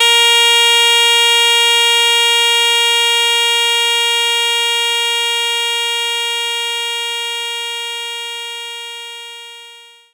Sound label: Shenai C3